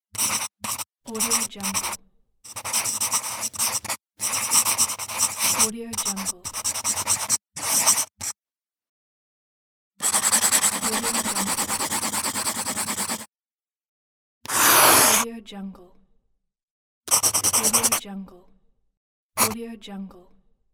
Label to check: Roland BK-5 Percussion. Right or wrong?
Wrong